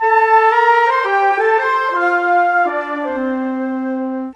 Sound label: Winds, Orchestral, Rock Pop; Sonic Foundry (Sony Creative Software); Orchestral Series VOL-4 - Rock and Pop